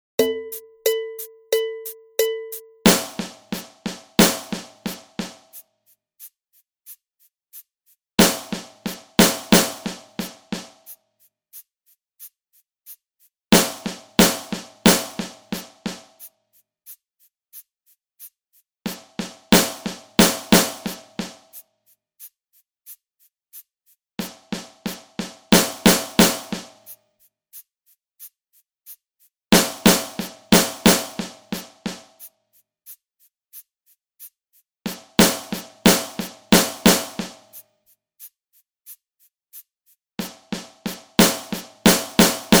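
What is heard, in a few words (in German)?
hören achtel betonungen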